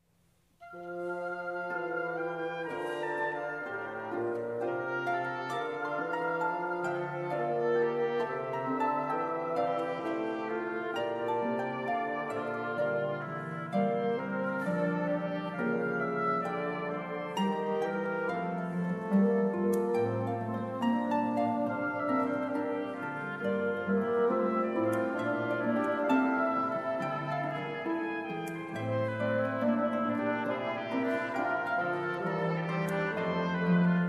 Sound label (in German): serenade fuer oboe fagott und harfe andante cantabile